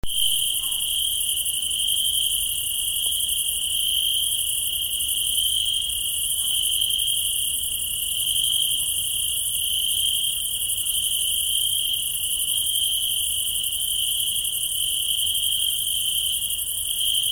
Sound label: Cricket Night Tuscany